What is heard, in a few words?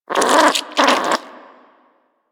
Vocal Snore; Warframe; Hunting Critters; Nexifera